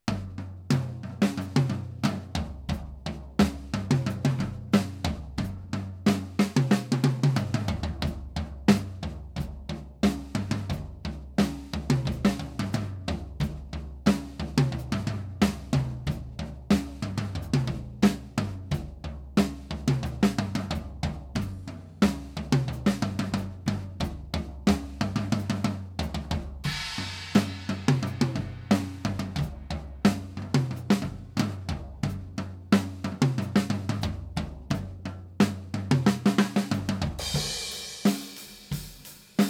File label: Overhead